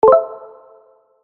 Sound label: warning